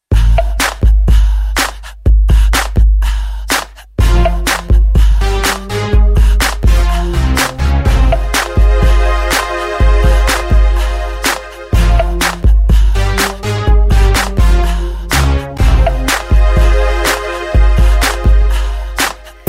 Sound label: hip hop beats